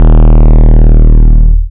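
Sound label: 808 D sharp clip, rage distortion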